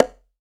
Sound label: CONGA, ST CONGA; AKAI S-Series CD-ROM Sound Library VOL-5